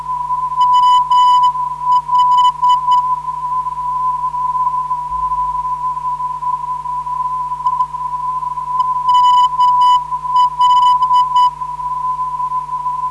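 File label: non-directional beacon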